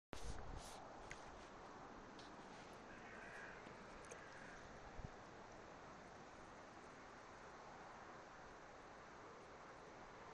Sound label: warbler; liesbospark